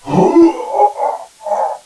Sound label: pain